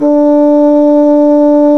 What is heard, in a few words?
Orchestral Winds Bassoon; Roland LCDP04; 90 s Sample CDs; Bassoons